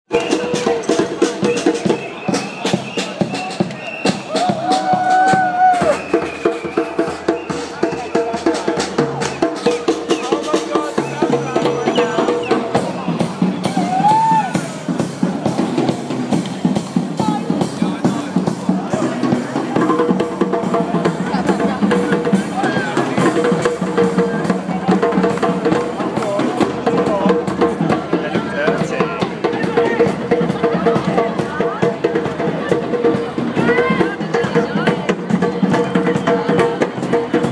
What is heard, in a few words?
drums